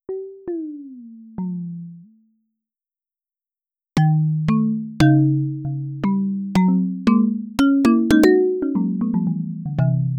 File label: marimba